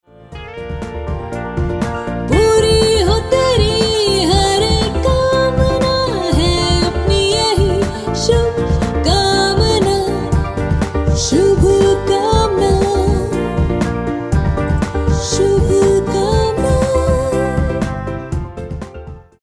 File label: BEST WISHES TUNE FEMALE